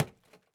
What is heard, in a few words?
Axe Barrel